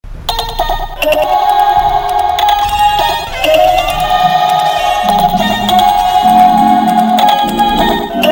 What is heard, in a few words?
Boss Stage